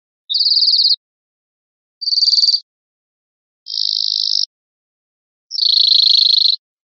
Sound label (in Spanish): chorros cortos amillerados, verderon